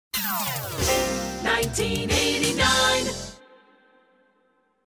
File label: Hits, Drops